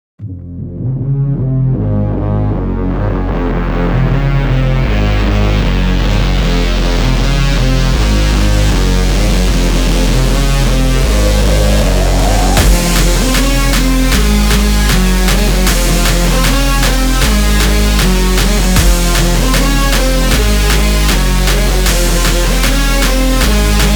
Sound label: Kit Fmin 155 Mix; GhostHack